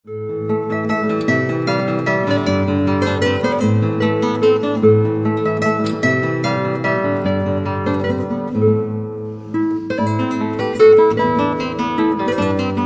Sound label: Morning Strings